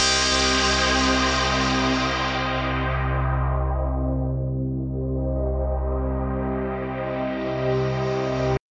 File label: Final Hit